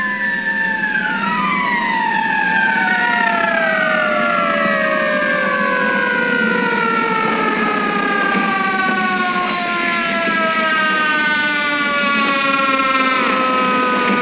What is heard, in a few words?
siren